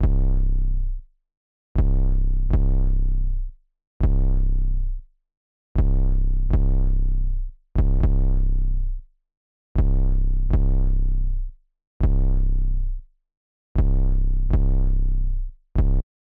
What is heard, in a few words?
Splurge 808 120BPM